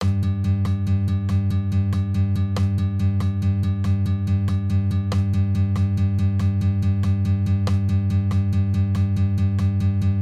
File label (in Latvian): Bluzs Sol Mazora Trioles; 1. Vingrinajums